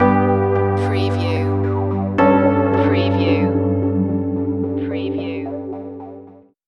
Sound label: new technologies stinger